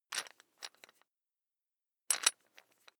electric drill bit fasten wrench turn loose

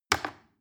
plastic button little click regular fast

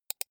computer mouse right click soft fast

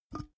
big can empty pick up

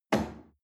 chair wood place soft

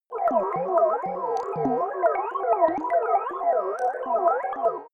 alien computer

